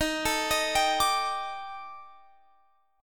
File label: Eb Major 7th Flat 5th, Guitar Open F; EbM7b5; arpeggiated